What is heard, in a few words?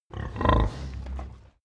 pig grunt close